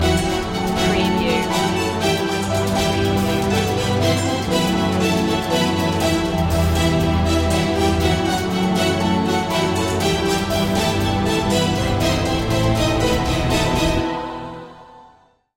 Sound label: action movie trailer